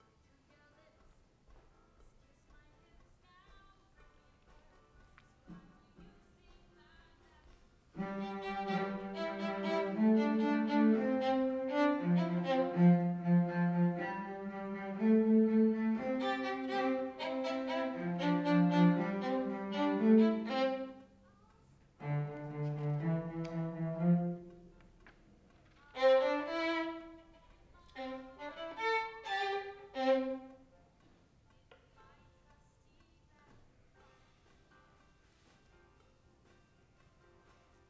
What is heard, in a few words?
violin